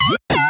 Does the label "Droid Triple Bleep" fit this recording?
Yes